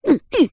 cartoon small character high pitched voice fight vocalisation